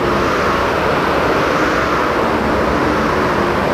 cloud transport

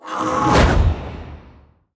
princess melee area

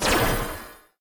basic attack ranged; heroes nyru

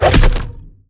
hit enemy; crocs; abominor

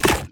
dragons enemy lava alfa attack

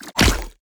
heroes basic Attack; grimson

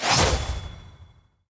event Horizon teleport In; tower elven Stargazer

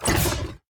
dragons enemy shadow evolved attack; sfx

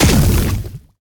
rage outburst impact; heroes kratoa

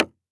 GUI Button Common

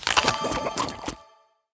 heroes lunch Break; torres

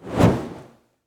Enemy Rocketeer

